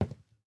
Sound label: footsteps WOOD